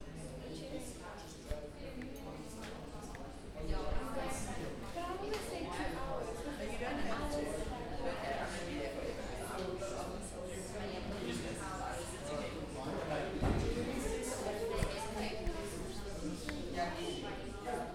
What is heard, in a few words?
Reception Background Chatter Amb